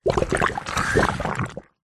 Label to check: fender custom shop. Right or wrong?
Wrong